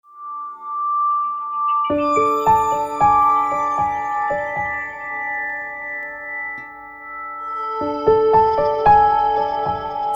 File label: Haunting TV Theme